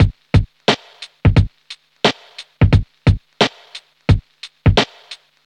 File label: 88 bpm drum beat g key, Free breakbeat - kick tuned to the G note. Loudest frequency: 925Hz; drum loop